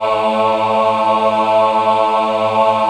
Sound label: Choir Acoustic & Synth, MENWO AH; USB Soundscan vol.28; AKAI